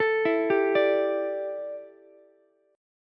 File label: D Suspended 2nd Suspended 4th D down Ukulele; arpeggiated